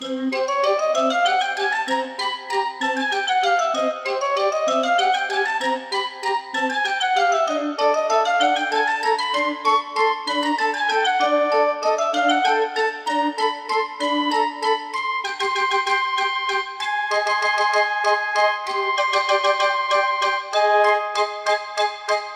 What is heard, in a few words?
danger funny; Identity V